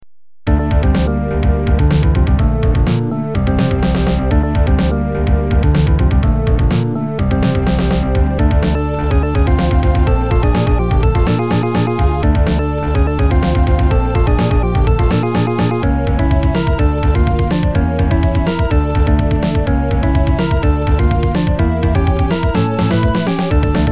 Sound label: level 1 bgm; castlevania; Amiga